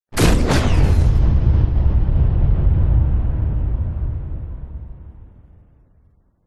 warfare missile bomb incoming whoosh into large explosion